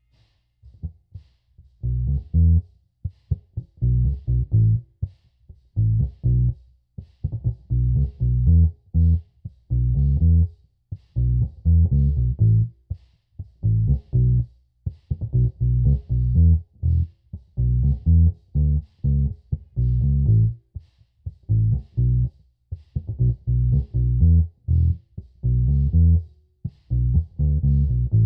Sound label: Bass Mic